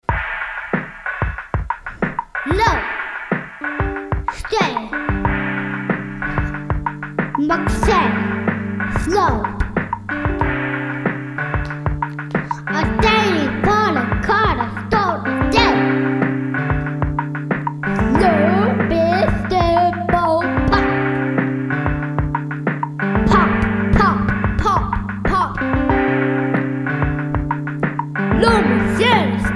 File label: Fun Song